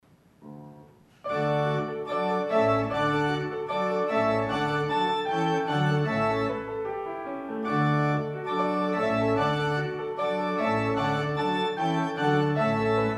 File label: Fanfare, Piano